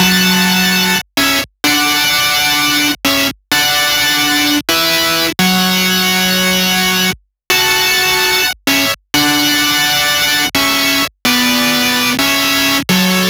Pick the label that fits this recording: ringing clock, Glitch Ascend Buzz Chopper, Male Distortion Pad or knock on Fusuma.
Male Distortion Pad